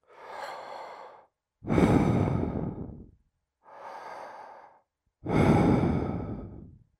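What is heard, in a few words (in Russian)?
звук дыхания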